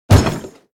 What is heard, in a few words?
chest drop; shweets sprites; cutscenes; womb ending